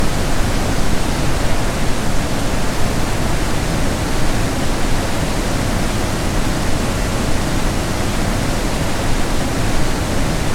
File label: Waterfall